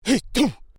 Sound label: Male Vocalization "Hun Cha"; Male vocal sounds; Exclamations; Voice